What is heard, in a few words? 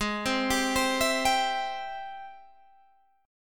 G sharp Augmented Major 7th G sharp Guitar Standard; G#+M7 arpeggiated; JGuitar